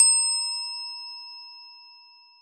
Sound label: Lightbulb, Classic SFX, cartoon; musicradar; cartoon caper